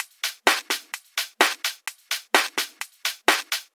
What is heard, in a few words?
House Loop, 128 BPM; Vengeance Samples